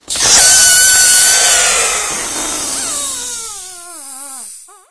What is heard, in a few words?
suit deflate; video game; die; ceda; Left 4 Dead 2; Source Engine; infected